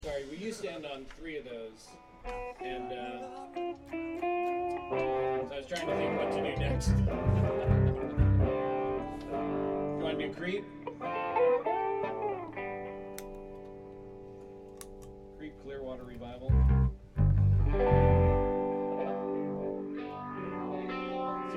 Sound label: Room Mic